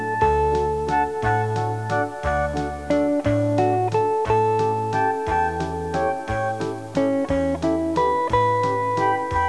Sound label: Viennese